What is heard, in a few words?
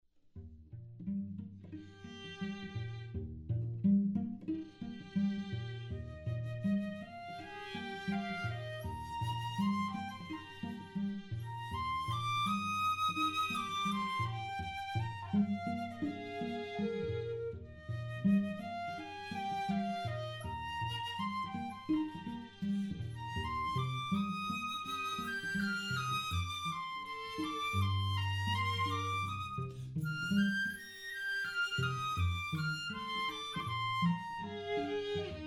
Entracte from Carmen Flute Trio; classical string quartet